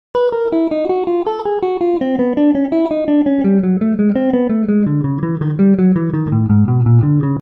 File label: spider pattern, guitar; spidertech